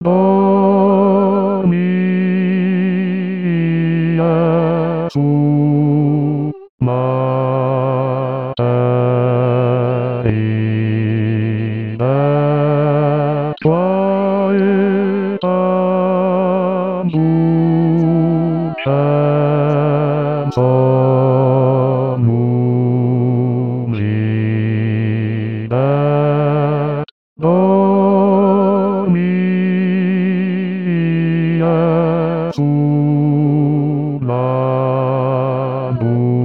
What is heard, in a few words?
Bas; Dormi Jesu; Boykin; rehearsal tracks christmas 2024